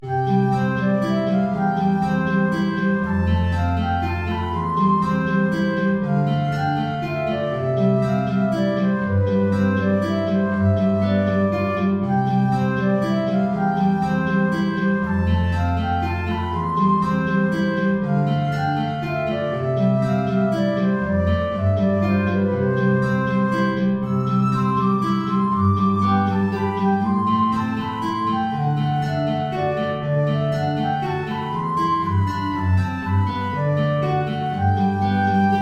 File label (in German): Menuet Querflöte Gitarre; BWV Anh 114